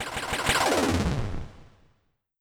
weaken echo

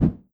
swish

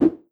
swish low

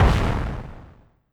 ninja smoke bomb

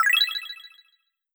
button ok

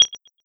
button beep pi

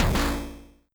robot footstep short

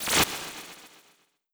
teleport fast appear

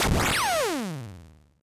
beam rifle lowbit

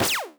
standard shot middle

